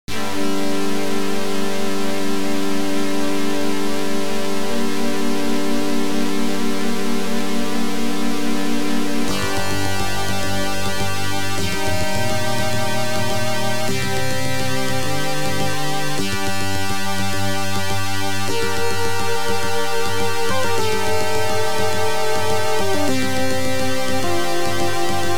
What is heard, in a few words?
OPL2; Tyrian